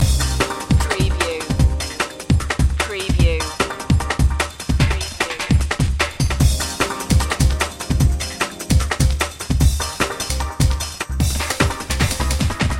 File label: aviation loop